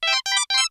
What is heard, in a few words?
music genre video game; WowieGameJam